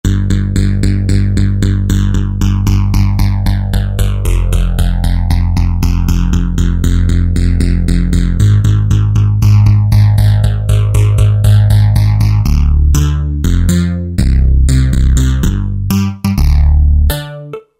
Stick Bass, Roland JD-990; Factory Patches

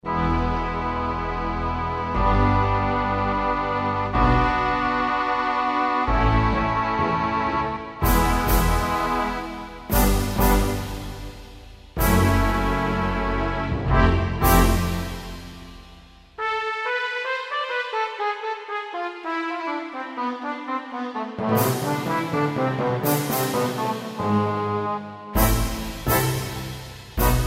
Kurzweil K2000 Orchestral ROM, Orch

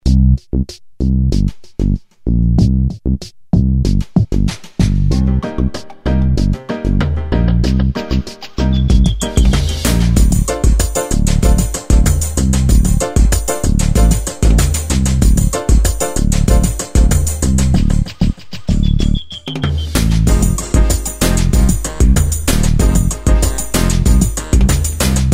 Dance Hall Reggae, Yamaha QY70 Preset Styles

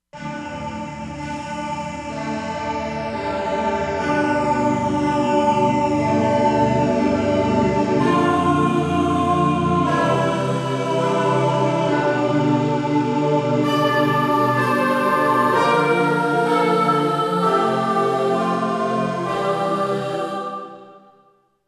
Angel Choir

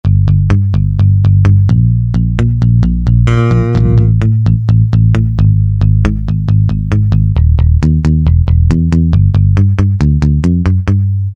Rock Bass; Roland L-CDX-01 Rhythm Section Instruments

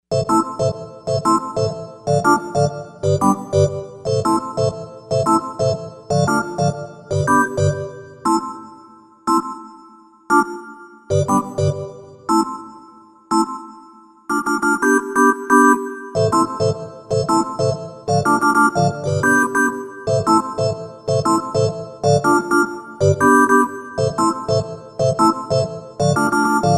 Reggae Organ, Korg TR-Rack

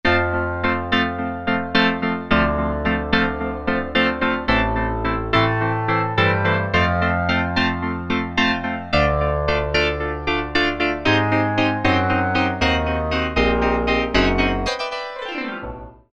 Loud Piano, Roland Alpha Juno-2; Factory B

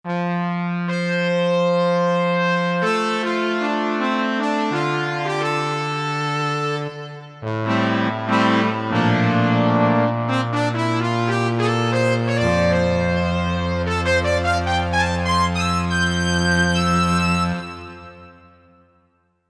Korg MSC-1S Midi Stack; Korg MSC-1S Variety

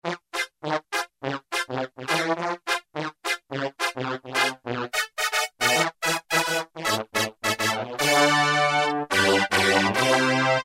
Double Brs, Roland U-110; Factory Patch

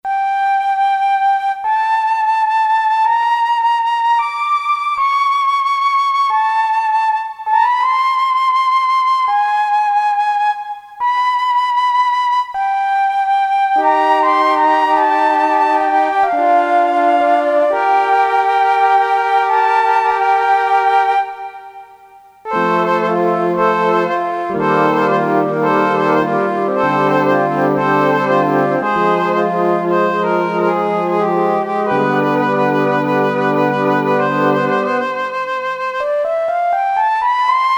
FLUTES, Korg DSS-1; Flutes & Harp

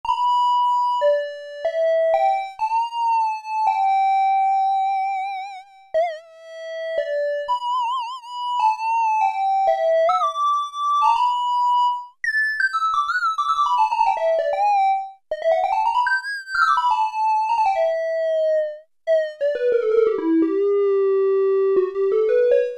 JAZZ SOLO, Oberheim OB-8; Factory Patches

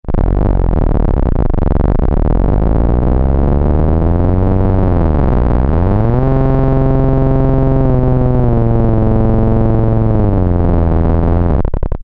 Idle Growl; Alesis airSynth

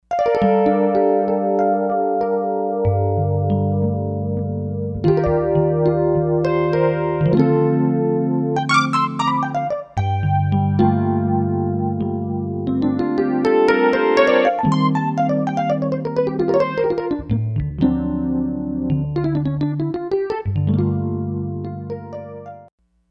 StageMK I, Kawai K5000S

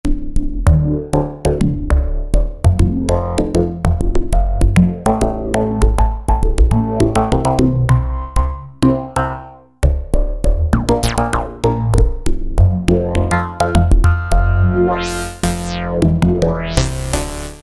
Bass, Alesis Micron; Factory Programs; Rhythmo

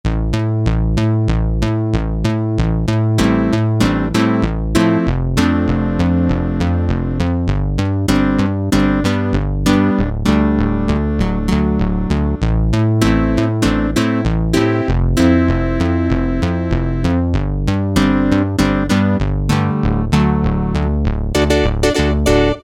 Proph Saw, Generalmusic Equinox

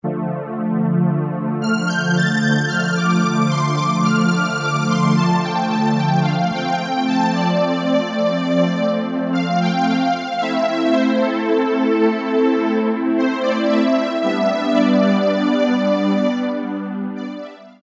HI STR SYN; Akai SG01v

